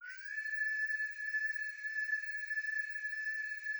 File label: F-15 Cockpit; DCS